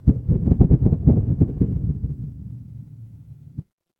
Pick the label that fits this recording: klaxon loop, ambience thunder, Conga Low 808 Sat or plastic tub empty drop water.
ambience thunder